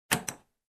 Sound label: Door lock click sound